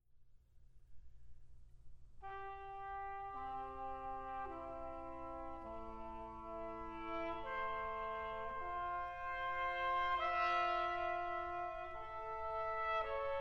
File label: Brass Quintet, Hushed, mysterious